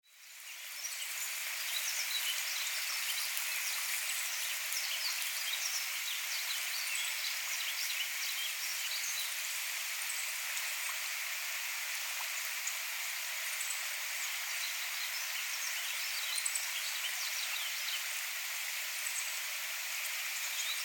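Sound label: Yellow-throated Bunting; miyamahoojiro; tottori; koe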